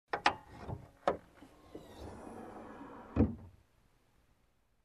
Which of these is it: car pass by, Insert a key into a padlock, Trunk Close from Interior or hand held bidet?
Trunk Close from Interior